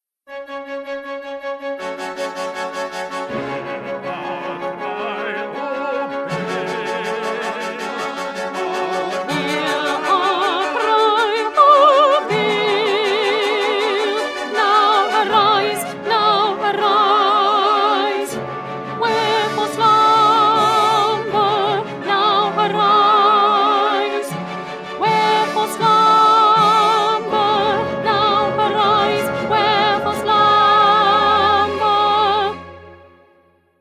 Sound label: Alto; Mendelssohn; Elijah